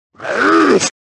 the sound iron knuckle makes; Ocarina of Time

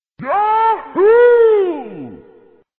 yahoo

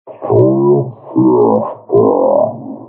flashbang; vapesquared

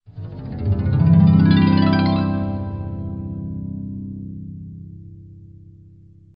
harp

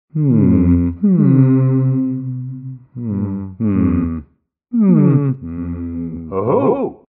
hmm; cave

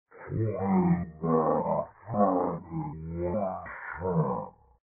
chromatic; vapesquared

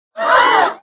crowd gasp

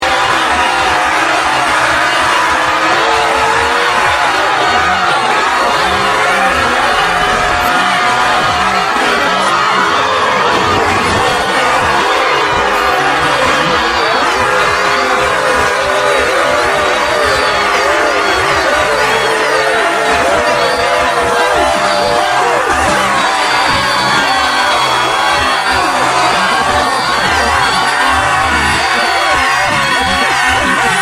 screaming, reverse

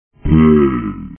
bowl; vapesquared

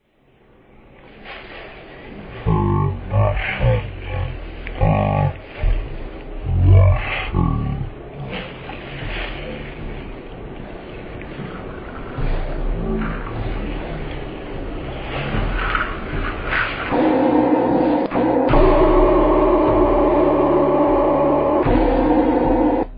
death whistle; vapesquared

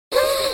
gasp

